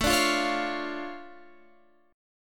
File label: D Minor Major 7th, A, guitar